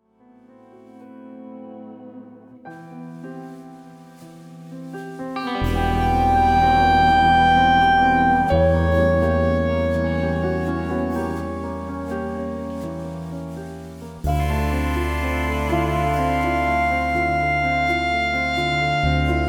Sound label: Waltz